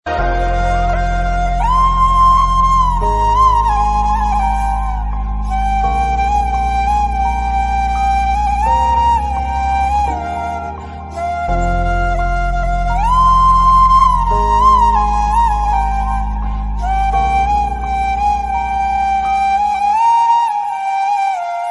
Instrumental Ringtone

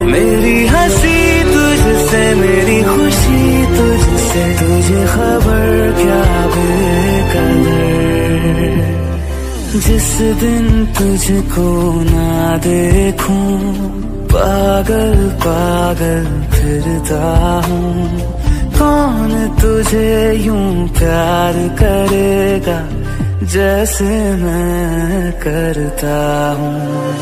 ringtone

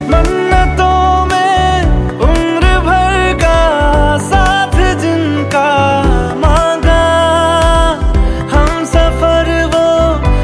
Ringtone

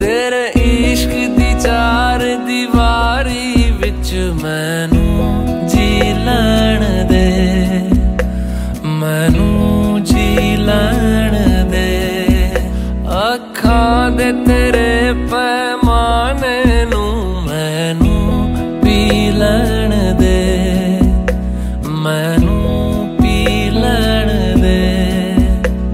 Ringtone

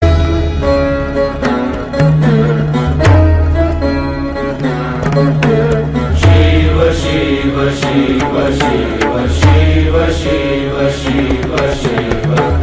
shiv shiv shiv shiv; ringtone

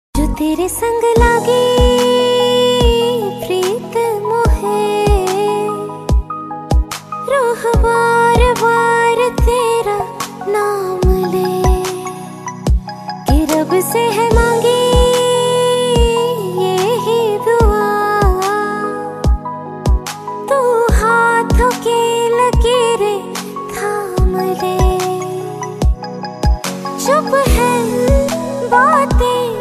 Female Ringtone

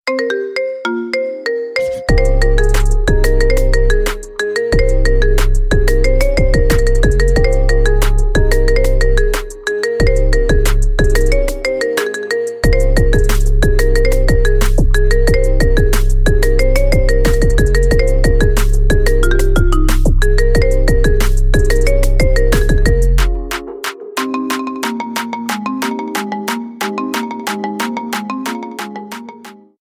Marimba Ringtone

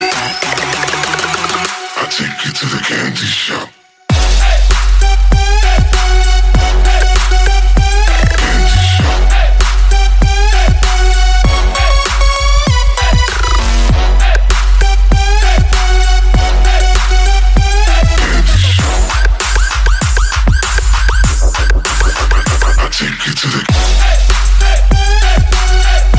Trap BGM Ringtone

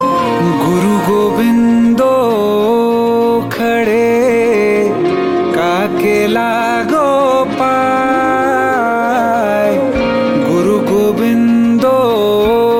Religious Ringtone

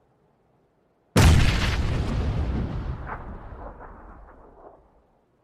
pubg grenade sound; ringtone

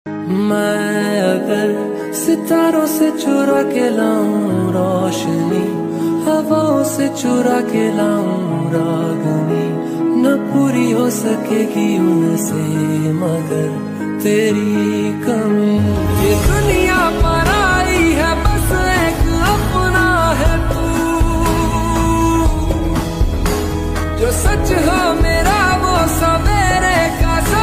Ringtone

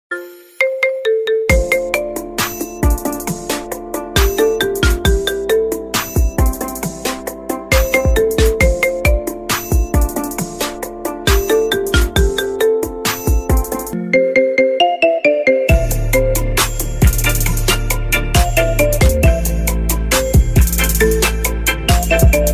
marimba

